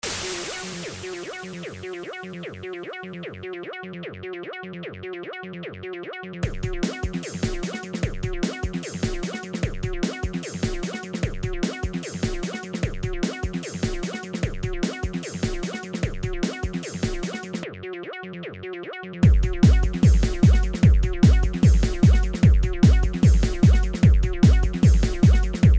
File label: warning loud bass